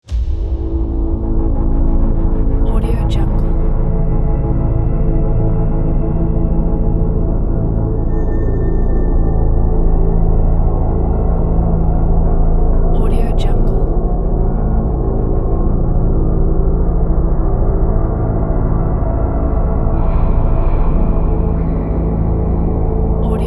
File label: thriller drone